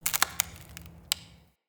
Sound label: Ext Branch Rustle; Midrath Island